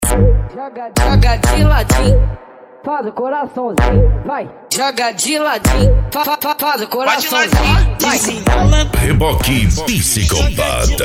eletro funk

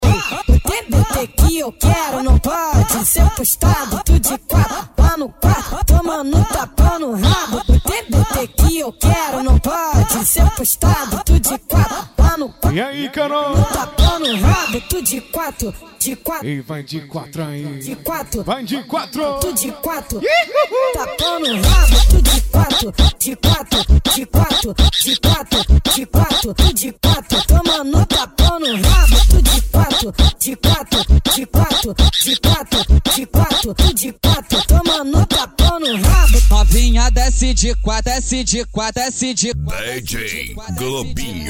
funk pisadinha sertanejo

